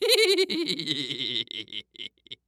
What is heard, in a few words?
Cartoon Human Male Giggle; AdobeCC; Scooby Doo Giggle